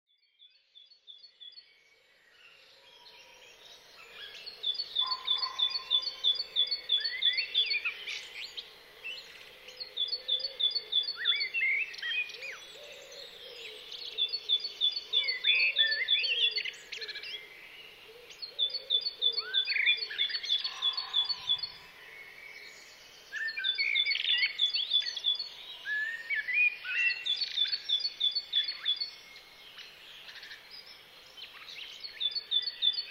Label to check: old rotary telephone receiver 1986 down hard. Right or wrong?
Wrong